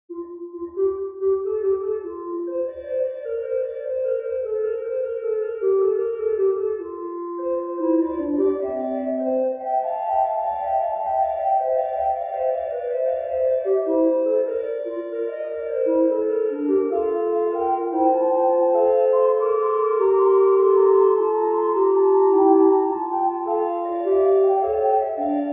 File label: magnificat fugue in the sixth tone, organ; Johann Pachelbel; The organ was built by the Quebec-based organ builders Létourneau